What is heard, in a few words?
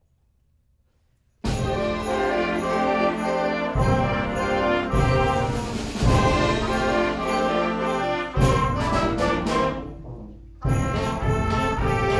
sleigh bells jingling